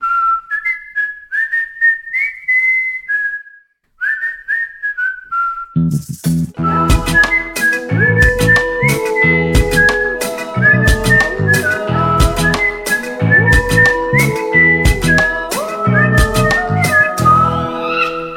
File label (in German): Klingelton; Stanserhorn; Android